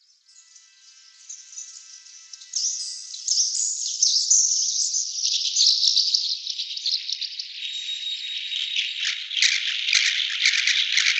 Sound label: birds effects